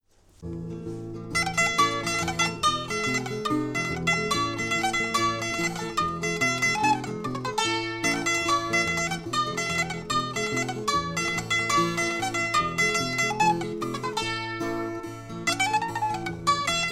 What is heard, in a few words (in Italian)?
tarantella al mandolino